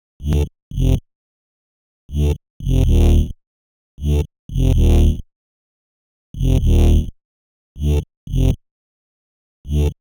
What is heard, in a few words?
127 G Bass, Complex Electro Synths; Prime Loops; Adversity